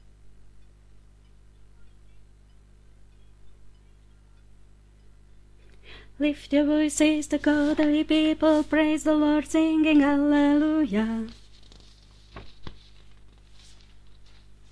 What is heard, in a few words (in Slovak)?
Africky zalm Alt; Vianoce 2018 - Africký žalm